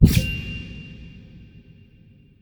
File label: fish heal buff